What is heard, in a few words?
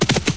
galop; DAoC